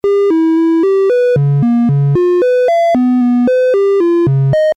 lfo rnd; ランダム; nord3

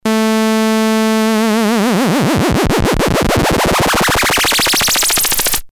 fm up

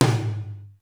md flat hl; sr16 tom

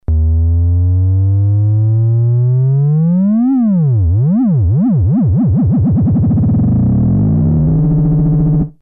lfo rate; めちゃ速; 約0.078Hz〜めちゃ速; nord; LFO 2

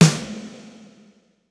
med balad; sr16 sd

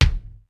dbl head; sr16 bd

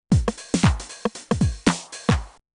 clap mix, tr909

